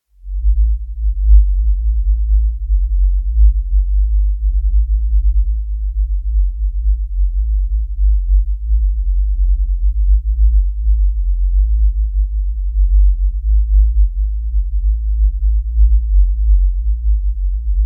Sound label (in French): bruit rose